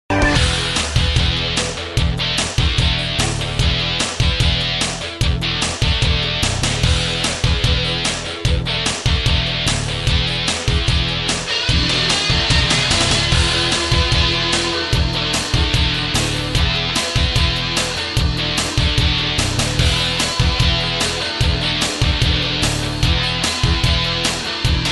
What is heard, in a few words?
Waves